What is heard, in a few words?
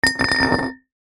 foley bottles glass milk x4 empty set down concrete; zapsplat